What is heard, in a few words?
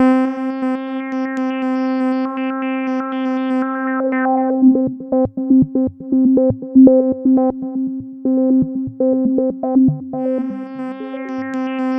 Roland JV 2080, interval; MG INTERVAL